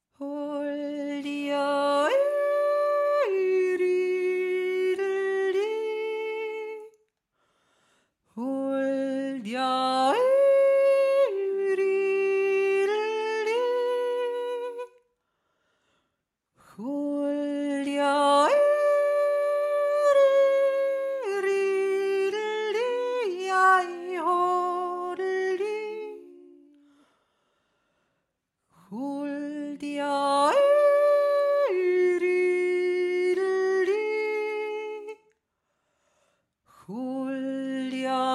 gusswerker hohe stimme, jodlerei

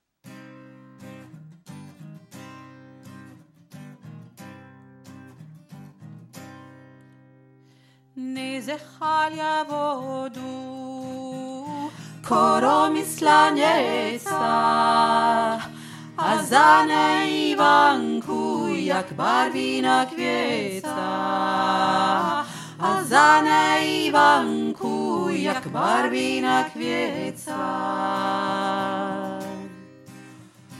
tiefe stimme

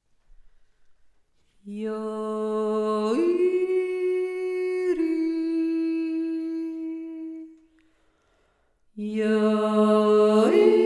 der oanfosche stade eine dritte stimme, jodeln; drinnen und draussen jodeln im waldviertel 2024

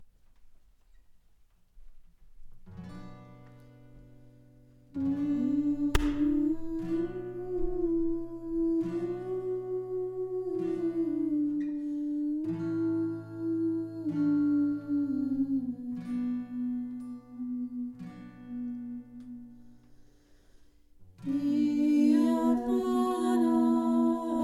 iavnana georgisches heilungslied

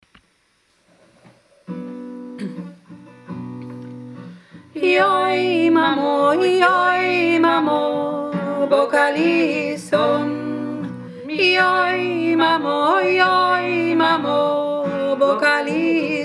yoi mamo 2 stimme; JOI MAMO

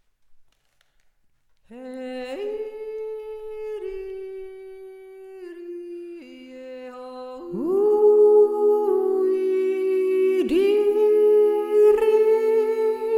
sunntigsjodler, jodeln im waldviertel; drinnen und draussen jodeln im waldviertel 2024

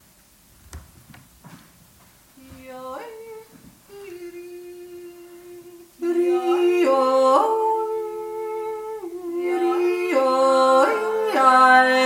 ldner verkehrte zweite stimme

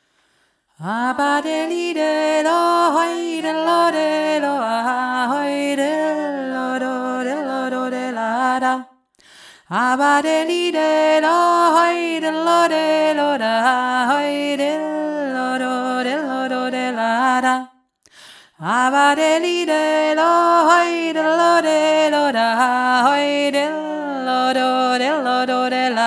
aba deli delo hauptstimme

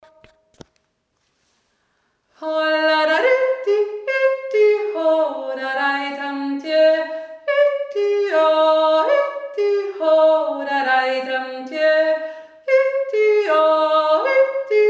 admonter echo

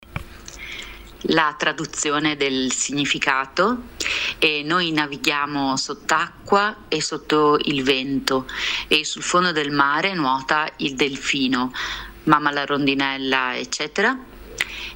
rondinella italienisch

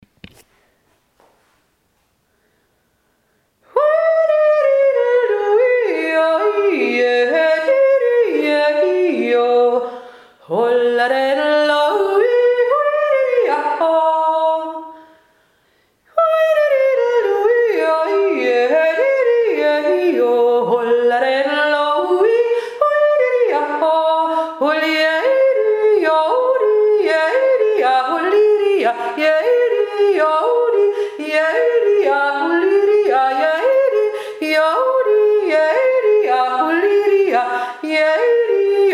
tiroler jodler langsam

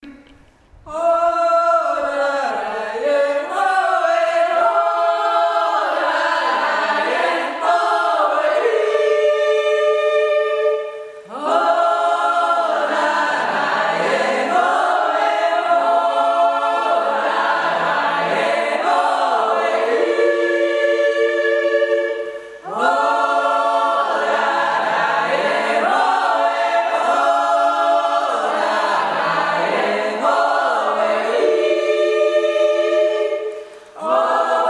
sunnseitnjodler

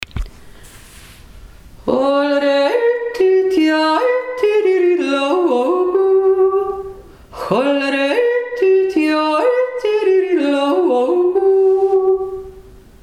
hermannsbravourjodler

